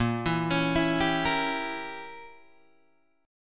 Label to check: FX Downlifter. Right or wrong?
Wrong